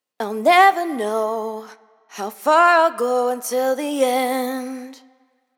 hook Never Know 120 Am wet, Vocal; Slate Digital Omnivox Vocal Sample Pack

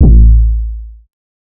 808 one shot A; Slate Digital BASSHOLE Sample Pack

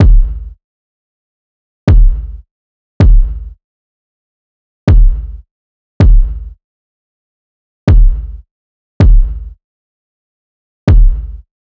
kick 80, Kick Loops; drum sample pack; SEISMIC; Slate

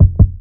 kick double, Drums, One Shots; Slate; SD AURORA Sample Pack

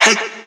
Vocal one shot; Slate Digital Omnivox Vocal Sample Pack

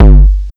Kick Smashing, 808 Kicks; Slate; Vintage Drum Machines Volume 2 808 Edition

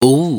"Ooo" Chop, adlib, Dry Vocals; Raw Vocals; Slate Digital Omnivox Vocal Sample Pack; Adlibs

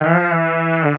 vocal blithe C; Slate; AURORA Sample Pack; Vocals

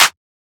clap clean; Drum Sample Pack; One Shots; Claps; SD SEISMIC; Slate

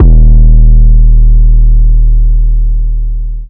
808 insane Eb, Tonal; Hip-Hop; Slate; CAICOS Hip-Hop Sample Pack

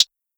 hat hard, Closed Hat; Hip-Hop; Drum One Shots; SD CAICOS Hip-Hop Sample Pack; Slate

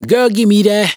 Dry Vocals hook Give me That Abm; Raw Vocals; Slate Digital Omnivox Vocal Sample Pack

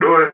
vocal do it Em; Slate; AURORA Sample Pack; Vocals

